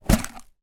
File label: food stackable potato chip tube lid remove; pringles